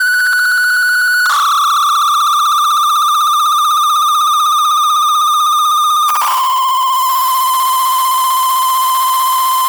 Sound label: High Frequency Feedback Loop; Glitchedtones; High Frequency Feedback Loops